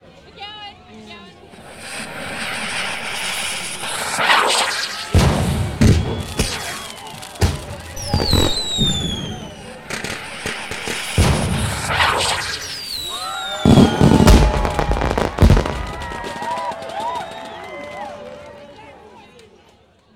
-, ringtone; SKY IM-A770K-VEGA-Racer

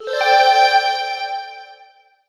voice mail, phone; HP iPAQ Glisten

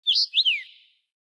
Tweet, notifications; LG E975 Optimus G

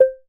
Electronic, notifications

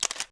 Shutter

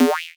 Doink, notifications; Android; Google; Android Open Source Project

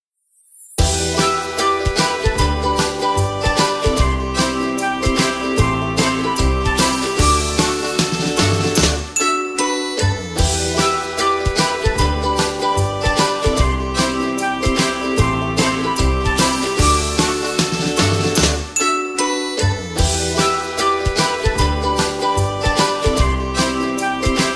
Ring Tone; eMobile

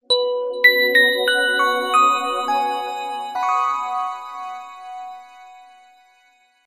Ring

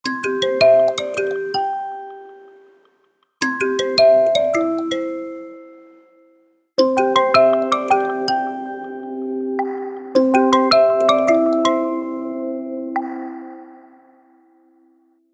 Nature, ringtones

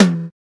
drumset, drums; SKY IM-A770K-VEGA-Racer; keytone

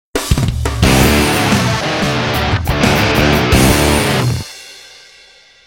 Message; Nokia; Alert tones

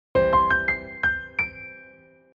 message, notifications; Gionee; Elife-E5

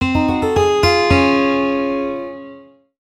Convenience Alert; Sony Ericsson; PlusFourSix; Venus (Xperia X1); Lars Edvall; Turn

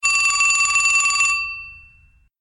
Vintage Telephone, ringtones; LG VS740 Ally

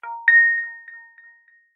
Echo; Infinix Hot 50 5G

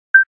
Beep, Nokia Tones; Windows Phone apps

